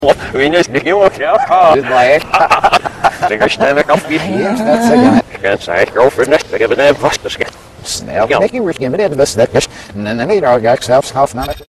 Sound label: chicken; reverse